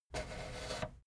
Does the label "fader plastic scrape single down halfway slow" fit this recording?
Yes